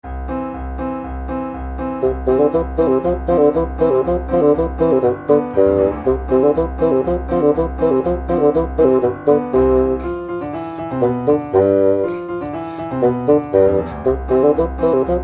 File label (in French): polka basson; czech